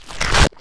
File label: ripper; Sonic Foundry (Sony Creative Software); Ugly Remnants VOL-2; Beatstuff